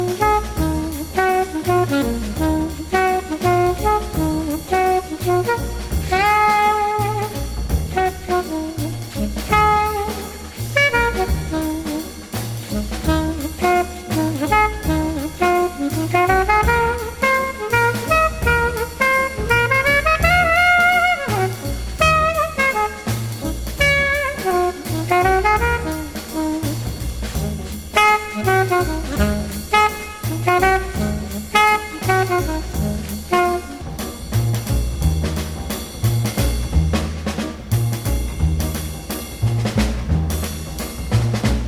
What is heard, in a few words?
COOL JAZZ